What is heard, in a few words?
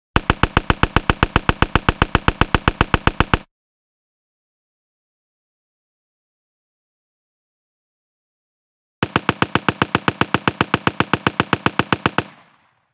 Machine Gun; Hollywood Edge; Heavily Armed